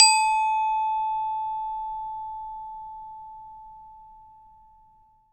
ORCH BELLS B; Synclavier Percussion; World & Orchestral; ILIO

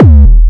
DIST. KICK; Kicks

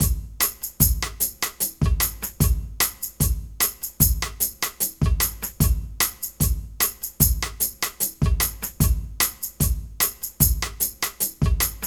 HIPHOP, Funky; INIS; Funky Xtreams

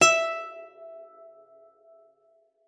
guitar E5; Xsample VOL-2

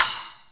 splash, Roland R-8

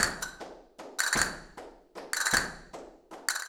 Castanets, Flamenco

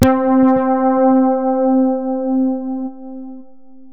Synths BASS

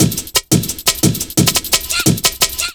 Break, Jungle; Limb; Breaks

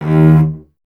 STR VC D#0, Cello; Miroslav Vitous; Solo Instruments VOL-1

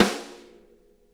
snare rim; Sonic Reality; Interactive Drum Kits

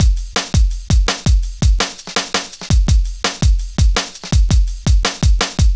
Drum Loops, Jungle, 166.5

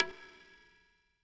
perc, Percussion; Trance Explosion Vol1

